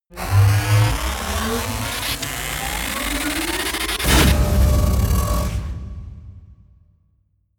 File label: electricity